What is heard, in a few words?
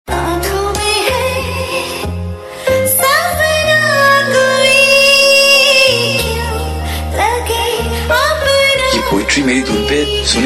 Female; TV Serial Tone; Channel V; Mastaangi